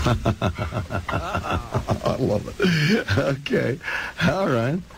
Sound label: laughing ok